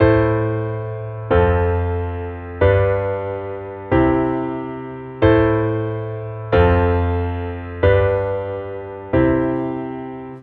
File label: piano